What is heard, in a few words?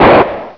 GUN; Death from Above